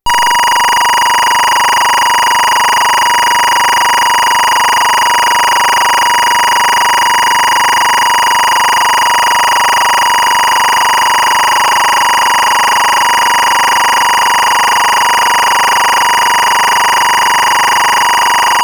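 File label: smpte 24 frames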